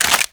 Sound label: Infested Sniper Rifle Reload; Weapons